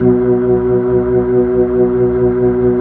B-3

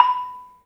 MARIMBA, B; 3D Sound; 3D Keys VOL-1

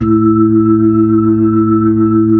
B-3, Blues

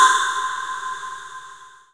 Korg Z1 Snare

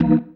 Stab One Shots, Organ; Loops; Stabs; Sonic Foundry (Sony Creative Software); Joe Vitale Organ Donor

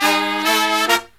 Killer Brass Riffs, 108 soul

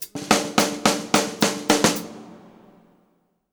Fills 106; Zero-G; Monster Beats

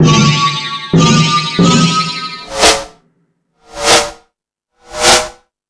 Hip House, Drum Machines E-MU Pro-Cussion

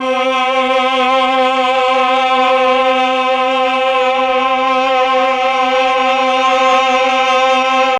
CHOR; ILIO; Propeller Island Legendary M400

